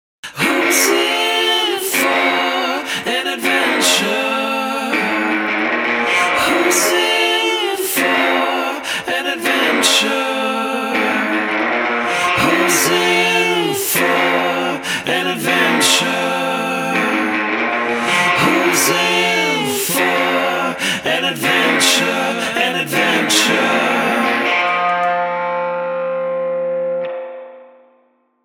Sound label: Adventure vox